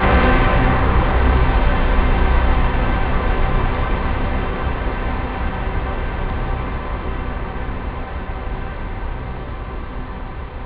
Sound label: orch